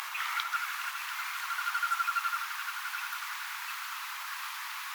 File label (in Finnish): telkka lentaa ylitse